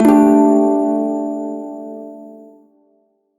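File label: Prime Shawzin Pentatonic Major Chord, Music; Warframe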